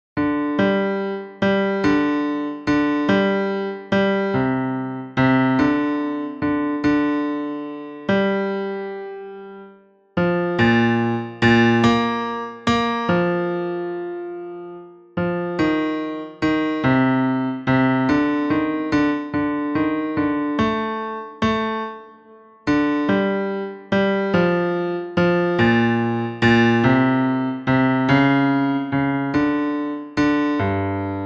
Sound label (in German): Unheilsame Torheit Bass